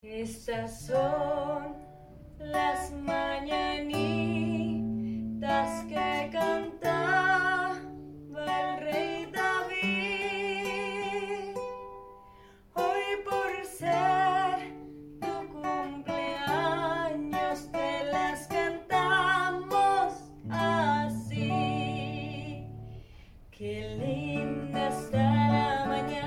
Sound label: Happy Birthday Song in Mexican